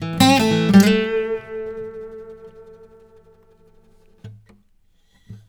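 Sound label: baritone lick A, Blues Guitar; ILIO; Fretworks; 108 BARI LICK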